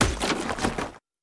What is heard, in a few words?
wagon death